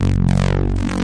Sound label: desecrated bass hit, evil bass sounds